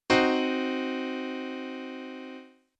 Akkord; theorie

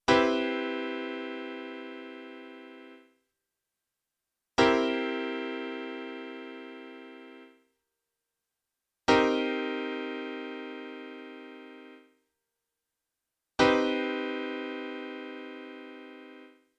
Septakkorde

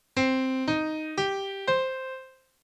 Akkord; theorie; Akkorde

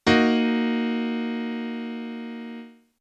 Dreiklang; theorie

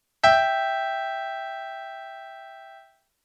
Intervall; theorie; Intervalle

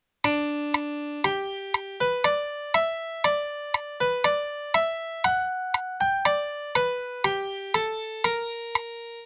melodie; theorie; Melodiediktate

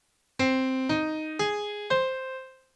Akkord D; akkorde hoeren; theorie

